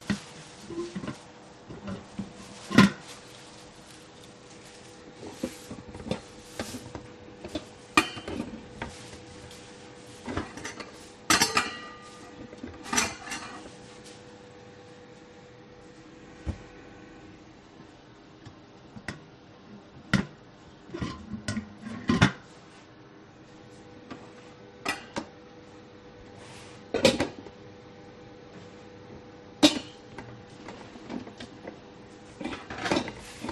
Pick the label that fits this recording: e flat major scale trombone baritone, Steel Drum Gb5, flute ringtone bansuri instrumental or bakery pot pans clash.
bakery pot pans clash